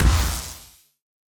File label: hit shock medium; CrossCode; battle